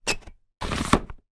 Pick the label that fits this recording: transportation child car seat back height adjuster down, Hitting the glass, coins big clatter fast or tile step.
transportation child car seat back height adjuster down